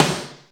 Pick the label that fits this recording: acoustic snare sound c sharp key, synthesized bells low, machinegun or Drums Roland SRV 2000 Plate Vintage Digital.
acoustic snare sound c sharp key